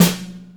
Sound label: clicky steel snare drum sound f sharp key